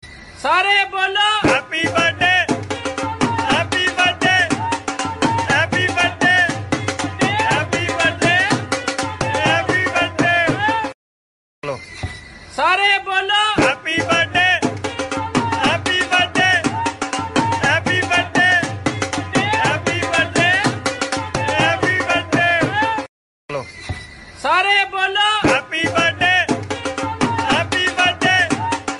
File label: HAPPY BIRTHDAY RINGTONE